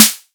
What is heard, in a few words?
soft hit finish